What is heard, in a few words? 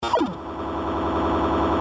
Death; bwiddow